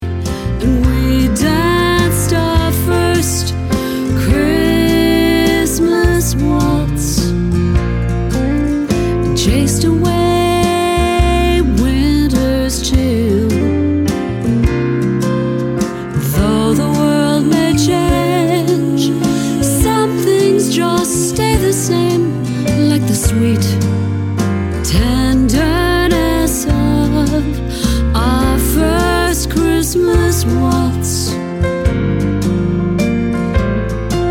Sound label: Waltz